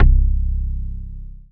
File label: Dance Floor Bass, Multisample Basses